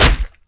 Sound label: blade fury hit; d2lod